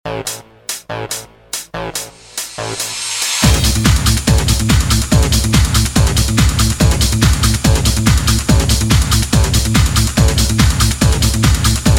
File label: loading